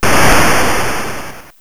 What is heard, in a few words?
explode